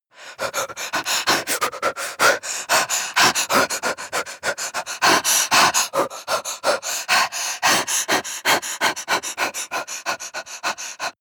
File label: breath female very fast unequally sequence